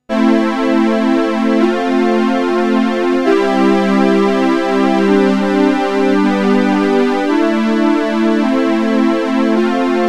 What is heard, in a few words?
jp 8 strings; Jupiter-8; arturia origin; sans aucun effet